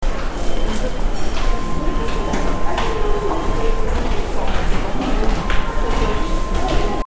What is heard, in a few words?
hall